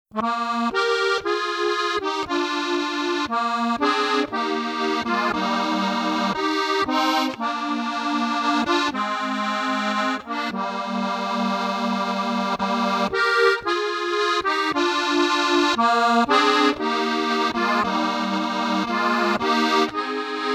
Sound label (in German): Harmonika TA3000eco schnell Walzer